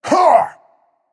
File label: grunt attack; smite; zeus